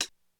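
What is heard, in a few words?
Hihat Closed, Korg DSS-1 Drums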